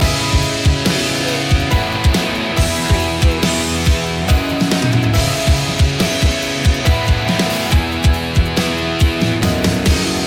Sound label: the race loop